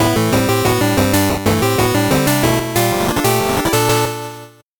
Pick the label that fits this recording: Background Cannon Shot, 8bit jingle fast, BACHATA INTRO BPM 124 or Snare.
8bit jingle fast